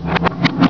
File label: spin hit